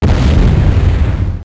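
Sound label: fireball; DAoC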